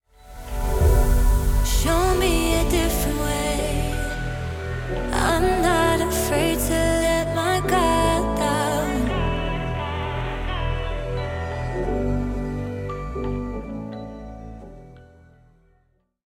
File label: mus death; Smite